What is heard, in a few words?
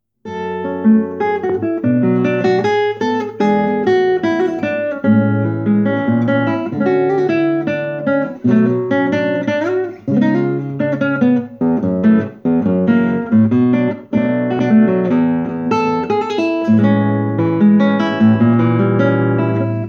Guitarist; Tucson